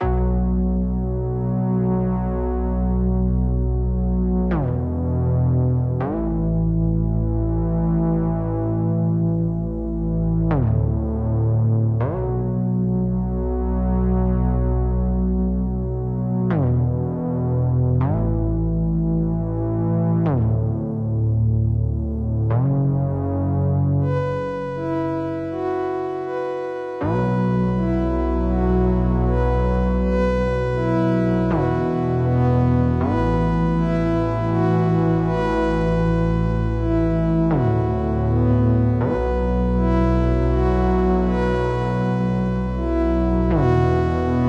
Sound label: Bass